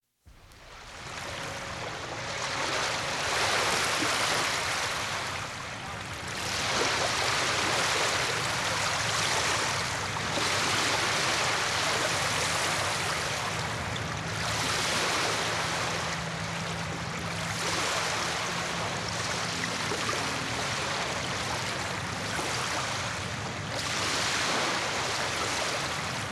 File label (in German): Urwald Sanftes Meeresrauschen